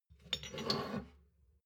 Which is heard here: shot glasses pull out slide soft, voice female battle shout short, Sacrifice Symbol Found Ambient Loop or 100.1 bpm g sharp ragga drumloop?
shot glasses pull out slide soft